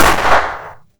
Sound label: Gunshot